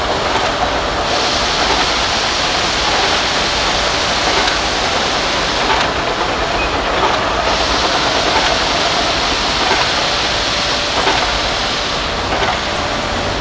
factory outdoor; ambient; Team Fortress 2

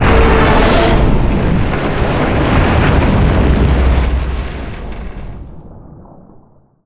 ships destroyer death; Hearts of Iron IV Death or Dishonor

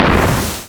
ship miss small effect; Hearts of Iron IV Death or Dishonor

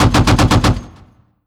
mechanized units mg fire; Hearts of Iron IV Death or Dishonor

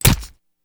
sword hit lo armor lo dmg; Mount&Blade Warband

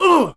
Archer Hit; Sid Meier's Civilization 4 Complete; Warlords; Units

